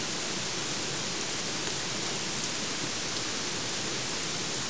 rain

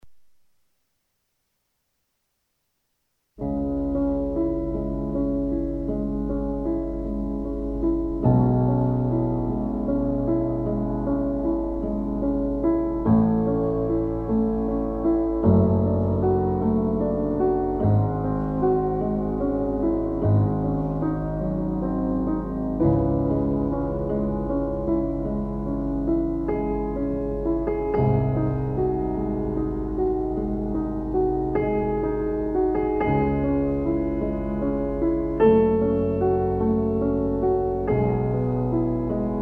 story song; damnedforest

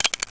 open, anaconda

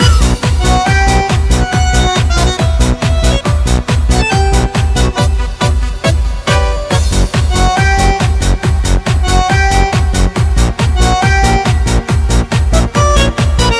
tango remix; cstrike; as resident evil siege

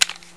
sniper mag out, weapons

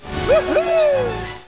woohoo; firearms

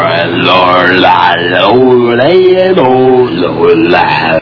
fan

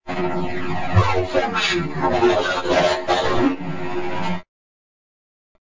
malfunction; svencoop; keencity

